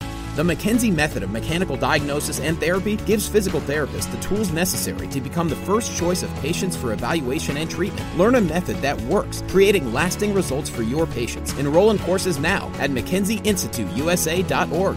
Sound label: Upbeat; Physical Therapy Clinic